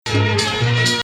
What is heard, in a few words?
Nahawand